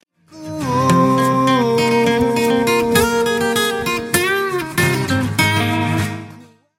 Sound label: Guitar Pick